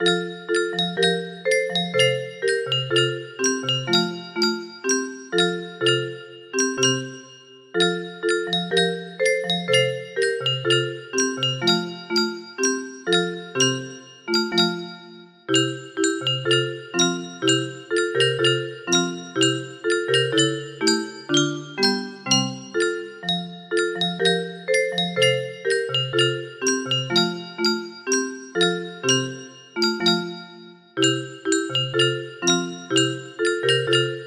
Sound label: ode to joy music box